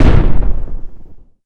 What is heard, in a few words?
weapons rocket